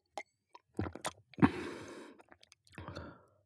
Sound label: drink glass milk swallow large